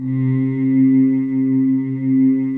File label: WAVING C3, Choir Acoustic & Synth; USB Soundscan vol.28 - Choir Acoustic & Synth; AKAI